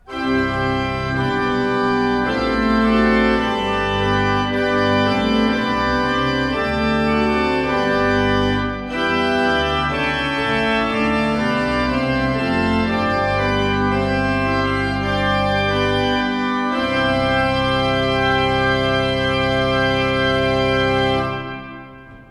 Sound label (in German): Euler II P, Orgel